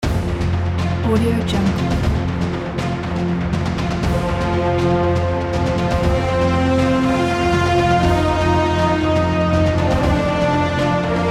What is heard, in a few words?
hero movie action logo